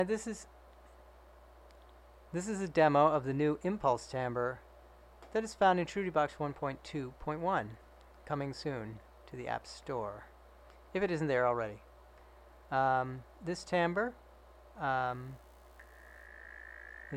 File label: impulse; iPhone